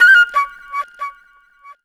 D.FLUTE; FLUTE MIX; E-Lab; X Static Goldmine 2; akai

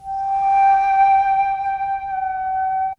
FLUTE

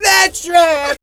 VB MEL, Voice Spectral

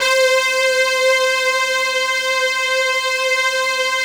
PAD, ATMO PADS; Best Service; XX Large

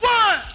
VOC

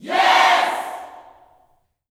"Yes", Vocal Gospel yell shout; Spectrasonics; Vocal Planet Vol.1 Gospel; akai

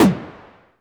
D3 PERC

